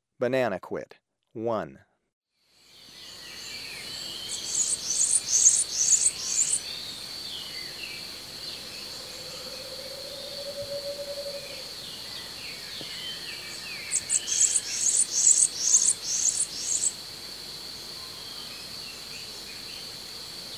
Bananaquit; S. America

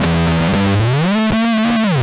Hyperfuzz, Guitars

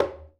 ESSENTIAL PERCUSSION

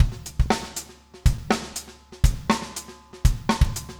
funk 120 bpm, loops

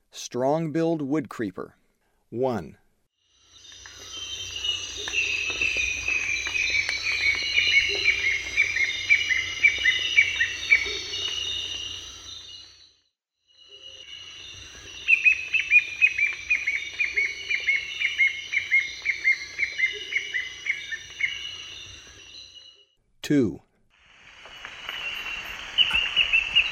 Strong-billed Woodcreeper; S. America; Eastern Lowlands

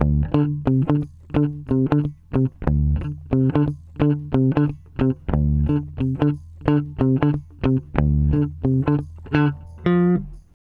Bass, RnB

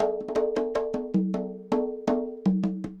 cong bongs 80, latin, 80bpm

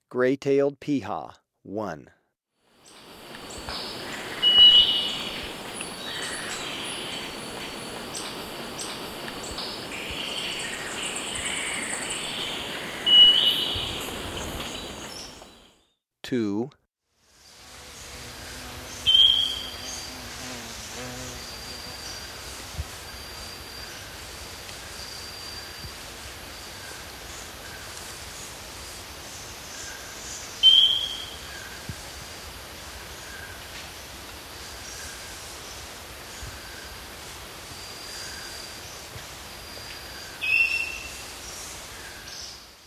Gray-Tailed Piha, Birds of Ecuador; S. America

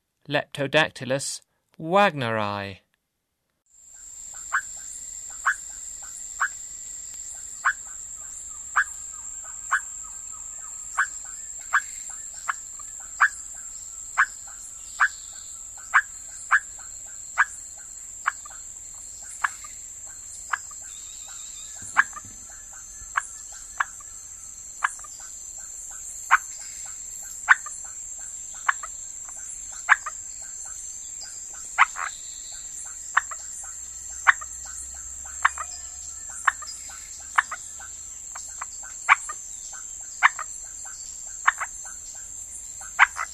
Leptodactylus wagneri, Frogs of Ecuadorian Amazon; S. America

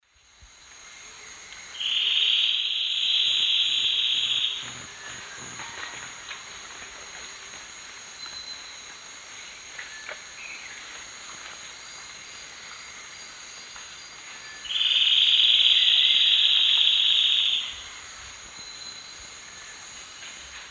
Conopophaga aurita, song male; S. America; Eastern Lowlands

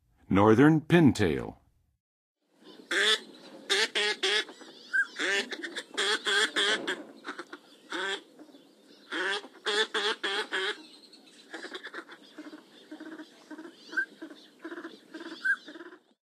Northern Pintail; North America; Western USA; Western USA Songbirds

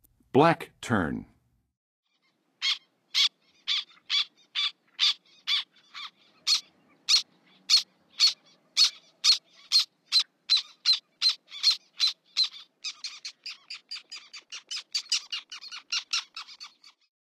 Black Tern, Birds of North America; Western USA; Western USA Songbirds

